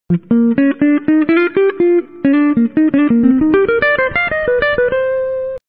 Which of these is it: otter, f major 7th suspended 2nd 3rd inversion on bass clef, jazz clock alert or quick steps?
jazz clock alert